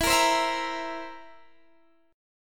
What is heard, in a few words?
Bb Suspended 2nd Flat 5th